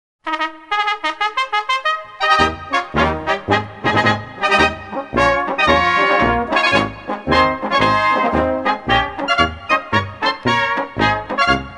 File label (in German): Volksmusik